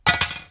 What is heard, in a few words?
metal; Timelock VR